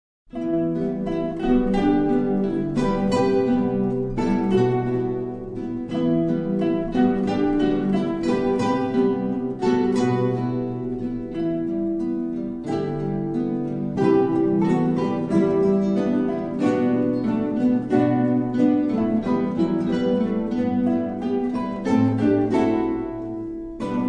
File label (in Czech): Kytarový orchestr; Anonym - Black is the Colour